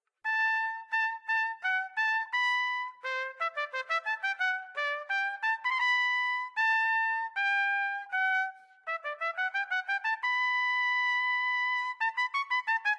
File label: Trumpet Credo from B minor Mass; Instruments